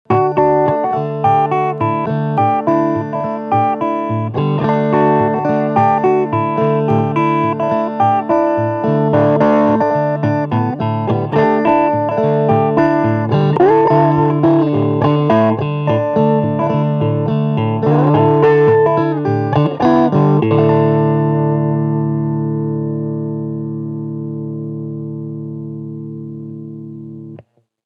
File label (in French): GeAmp, Intermède en Ré sur Strat, réglages de Tone; 3 réglages de Tone